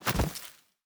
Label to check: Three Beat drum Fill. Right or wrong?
Wrong